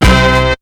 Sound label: shot; BEAT2000